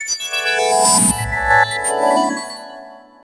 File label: Computer Talk, High Tech; Sony CLIE PEG TG50